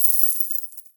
Coins Drop; kult

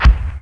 Impact; Falcon 40

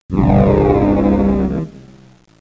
error

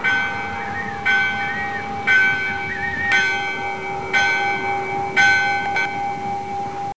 church; dracula

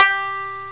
banjo pluck; Sound Effects for Multimedia - Volume 1

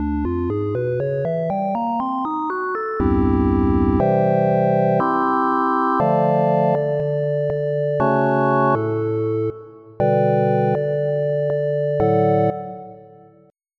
Organ; Onj's Soundfont

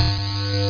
exit Sound; Munchies v1.0.6

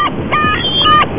SEAGULL

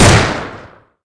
colt 1911 fire; Soldat

